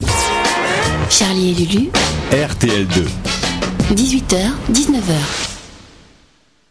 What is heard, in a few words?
jingle; rtl 2